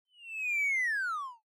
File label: Motion Falling Whistle Fade In Mid